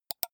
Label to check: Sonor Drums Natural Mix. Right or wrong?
Wrong